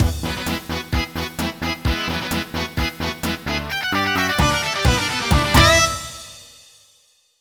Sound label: fanfare